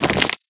walk, antlion; creatures

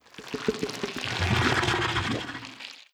idle subtle, barnacle; Half Life Alyx

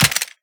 weapon pistol impact; physics; weapons

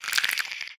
relax, clams; infestation

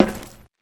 metal, footsteps; player

